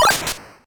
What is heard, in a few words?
Other attacks, 8bit; カタナ１